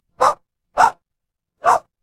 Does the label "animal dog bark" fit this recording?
Yes